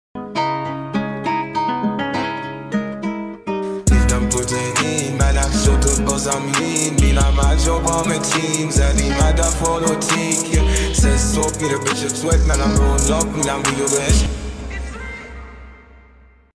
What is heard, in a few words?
hiphop - rap-ukdrill; هوش مصنوعی